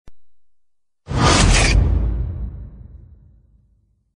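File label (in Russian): быстрая синяя вспышка; Звук огня; Звук огня для монтажа перехода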